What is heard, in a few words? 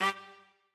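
trumpet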